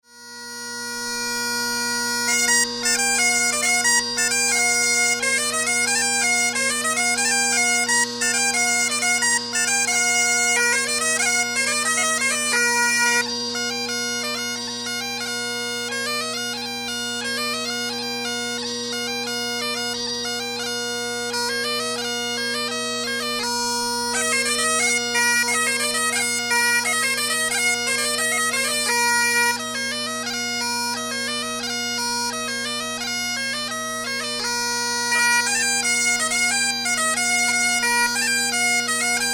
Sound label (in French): bombarde cornemuse; ton bale ploeven 2010